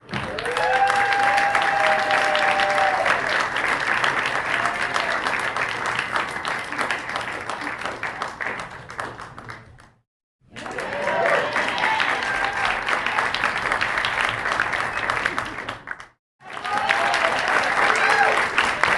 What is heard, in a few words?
cheer clapping small group in theater applause